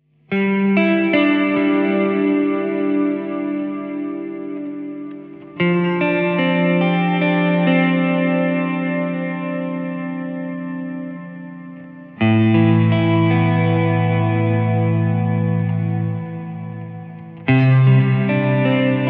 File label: Modulate